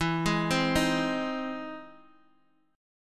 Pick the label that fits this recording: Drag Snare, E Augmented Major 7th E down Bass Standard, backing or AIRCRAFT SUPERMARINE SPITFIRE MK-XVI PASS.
E Augmented Major 7th E down Bass Standard